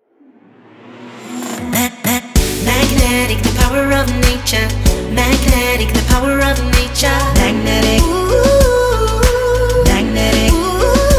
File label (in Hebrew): גינגל; מגנטיק